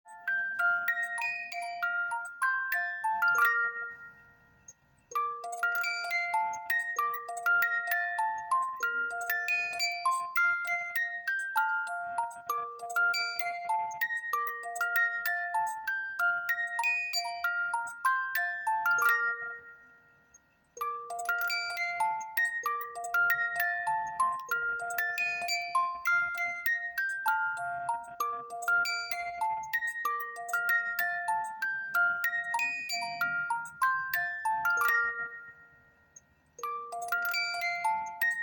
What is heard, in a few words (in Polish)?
karuzela melodia